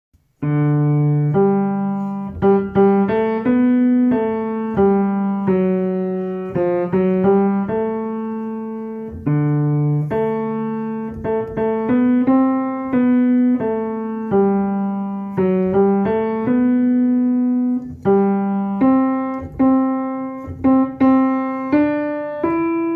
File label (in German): Tenor; Bajazzo; 2. Tenor